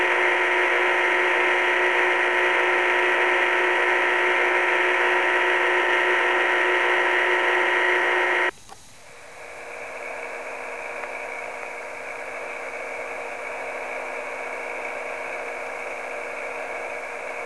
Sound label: Quiet Cover Sound